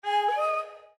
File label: Windows Exclamation; abbottabad compound